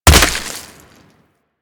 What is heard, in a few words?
Ice Hit Effect; Warframe